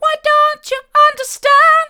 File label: why don't you understand hard, vocal